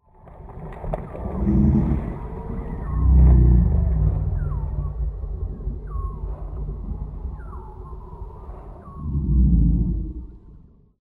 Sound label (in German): Tyrannosaurus, dinosaurier stimmen